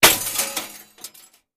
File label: Bullet Impacts Metal